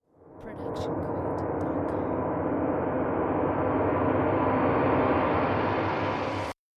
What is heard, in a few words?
horror low rumble swell; soundscrate